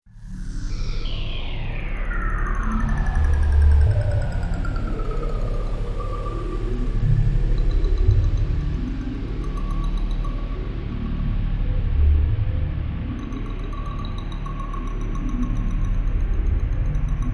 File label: Drone Synth; Sound Design; BlastWave